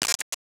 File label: Tech, Weird Side Samples